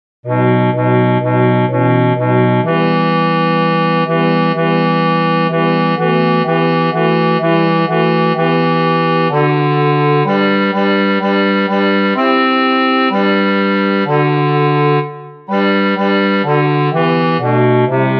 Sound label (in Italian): In Questa Oscurita BASSI